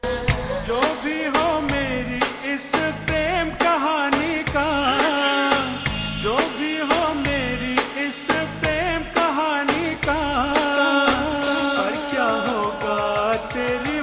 Slowed Reverb

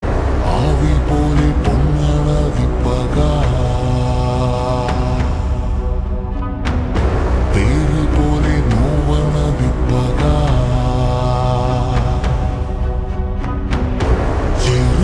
Slowed Reverb; ponman

